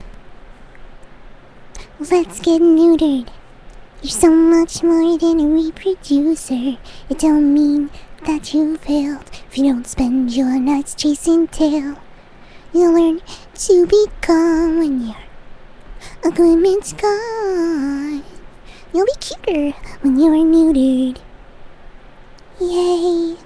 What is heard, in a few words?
Singing, song; Lets get neutered song; Fluttershy